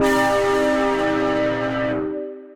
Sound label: Old Rusty Keys, Synth Keys